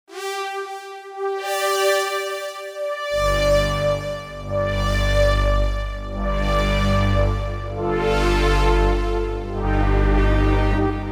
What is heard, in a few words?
Von Greece, Roland JV-880; Factory Patches